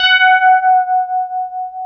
DS8 F#5, Korg

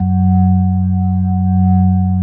B3, First Call Vintage Keyboards

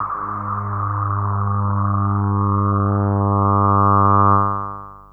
Synth hits and riffs

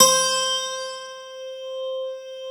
GUIT C4; USB Soundscan vol.51; House Side Of 2 Step; AKAI; GUITAR

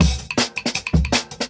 160, Jungle Breaks; Original Jungle Breaks

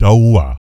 PERC, Vocal, Jazz FX, BASS PERC; Spectrasonics Vocal Planet

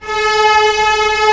STR D#2 FF L, STRNGS, Orchestra; Sound On Sound - Peter Siedleczek's Orchestra

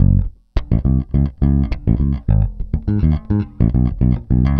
Pop, Bass

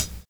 DISCO HH, DISCOKIT; USB Soundscan vol.11 - Drums Dance & Groove; AKAI

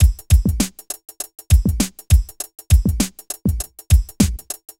Beat

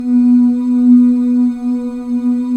BGV OO

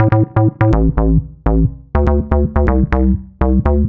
A 123, Synth Loops 123bpm; Zero-G; Soundclash Nu Dance Electro; Acidized

